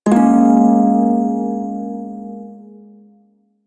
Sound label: Prime Shawzin Yo Chord J; Music; Warframe